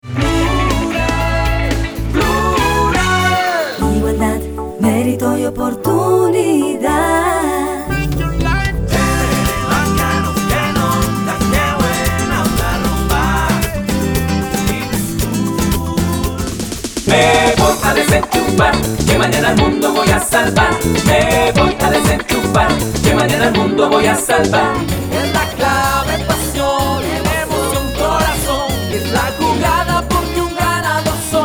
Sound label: commercial jingles